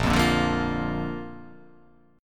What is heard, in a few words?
A sharp Major